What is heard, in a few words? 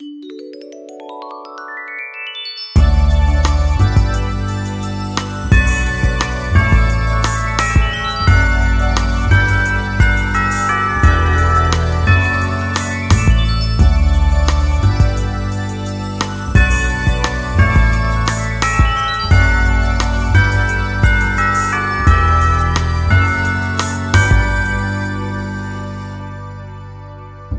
chime